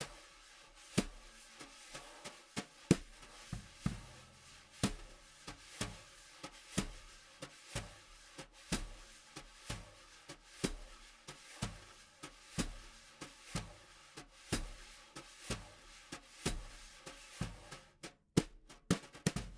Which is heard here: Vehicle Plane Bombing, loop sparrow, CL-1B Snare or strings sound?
CL-1B Snare